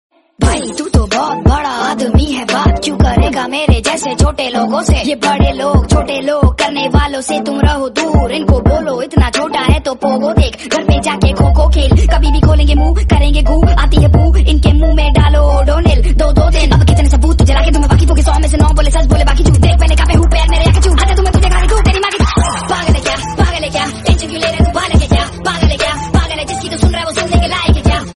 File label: cool rap song tone ringtone, Bollywood